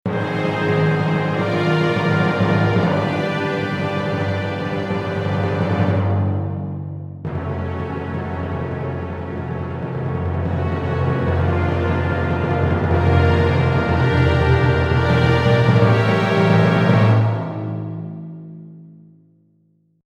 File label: LH Timp Roll Orch, Orchestral